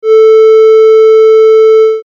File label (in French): tube vitesse